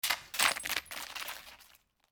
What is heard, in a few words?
plastic auto part incoming scratching on concrete; matter mayhem